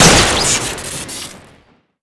ak shoot crit; weapons

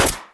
snap shoot

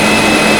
sewing spin, weapons

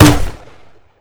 pumpkin shotty shoot mono; weapons

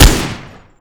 smg shoot; weapons

